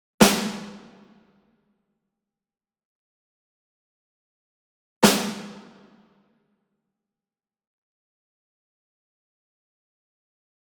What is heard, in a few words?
SINGLE SNARE Pearl High Tension Hit Medium; boom library; Cinematic Strikes Construction Kit